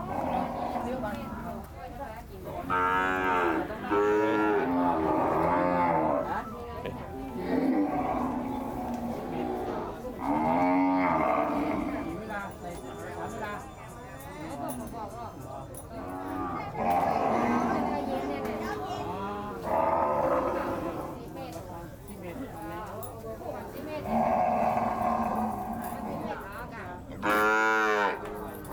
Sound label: Chiang Mai cattle market